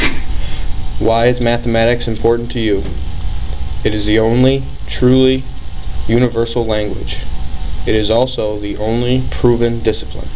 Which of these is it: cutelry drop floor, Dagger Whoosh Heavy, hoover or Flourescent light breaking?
hoover